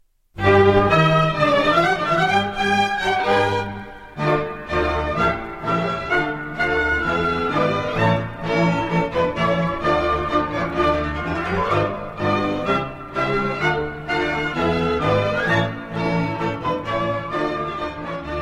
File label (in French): Verbunk, Hongrie